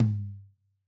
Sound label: TOM HIGH HIGH TOM; Sonimus; a series drum pack; A-CONSOLE; A-EQ